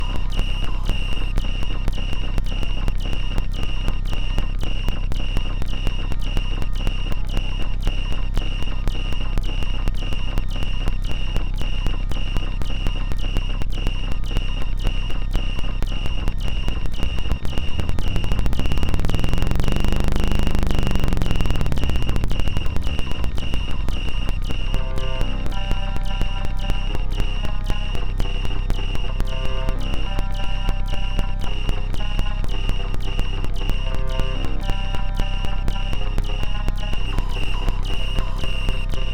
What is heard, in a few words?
menace droning, synth, songs of insects